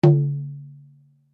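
Drum Flat Skin forte, Drum heads isolated from drum shell; Drum Flat Skin B; Musical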